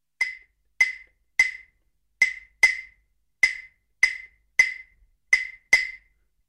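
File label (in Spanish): Claves, Percusión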